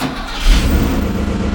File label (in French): voiture dem; East West - Global Sounds; SC-55 FXS